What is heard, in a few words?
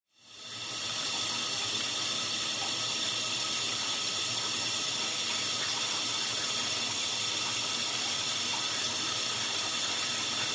Water Pipe Home